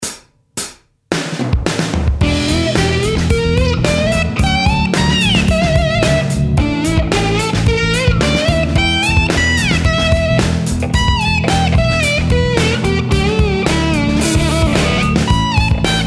slide, mix

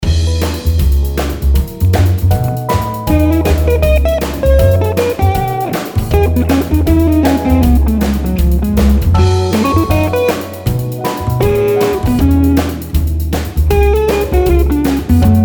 improvising 158bpm solo Mix

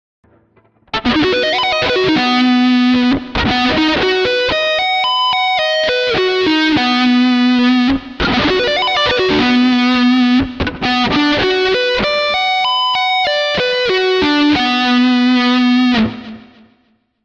shred, guitar

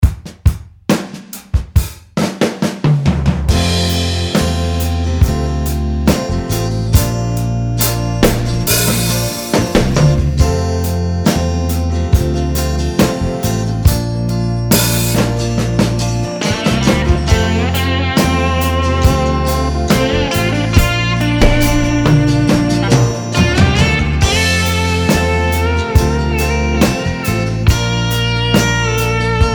slide solo mix

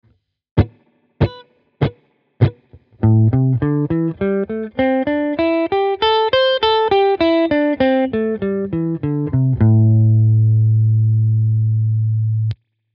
blues Minor Pentatonic

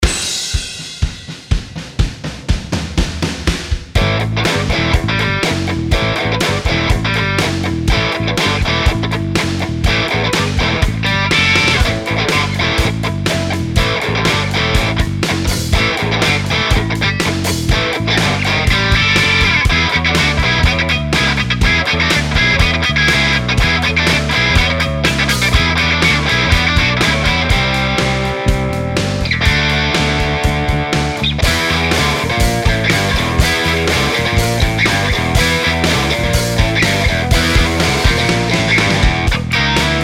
backing

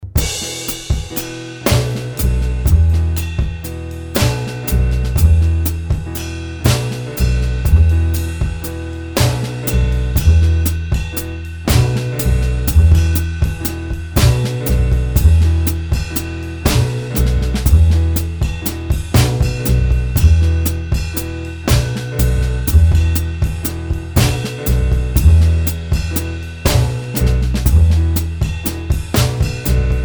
improvising backing

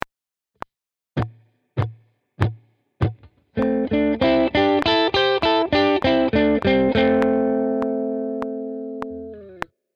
blues, guitar

